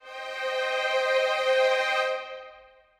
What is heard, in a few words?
c min chord